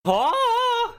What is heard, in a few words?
night attack; dax